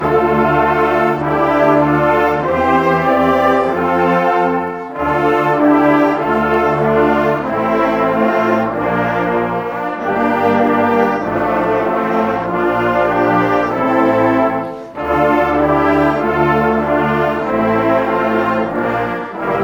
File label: brass, ohne oberstimme; coronabrass; christ ist erstanden